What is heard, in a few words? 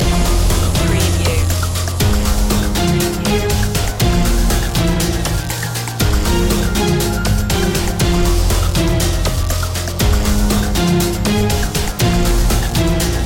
monsters of war loop